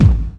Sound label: BD, Dance Mega Drums; Best Service